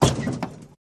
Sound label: Auto Crash Sml Head, car crashes